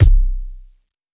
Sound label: phat steel kick drum C key